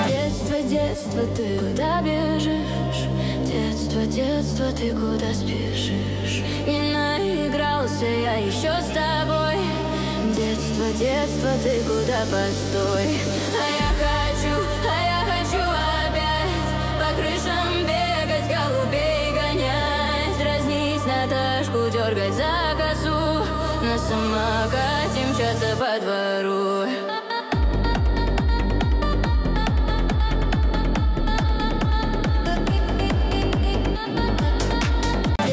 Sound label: Techno Remix